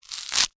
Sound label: Bite Chew Chomp, Single; Foley; Eating Sound Effects; Human; Blast Wave